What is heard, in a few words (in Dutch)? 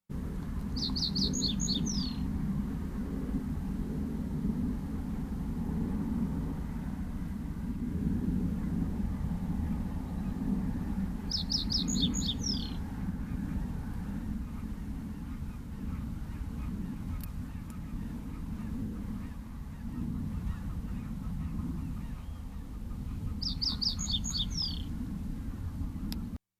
vogel in riet